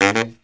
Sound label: baryt, Killer Brass Riffs